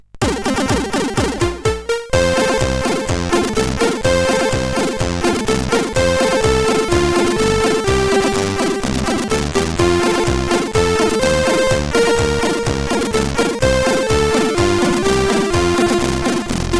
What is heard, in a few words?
Saints Go, sid CSG8580R5